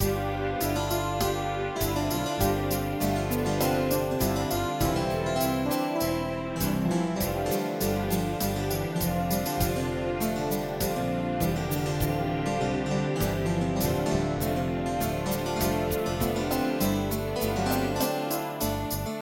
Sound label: canon